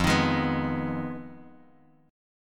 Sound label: F Minor 7th Flat 5th Guitar Open D; down; chord shape